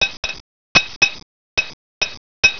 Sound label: blacksmith working, hammer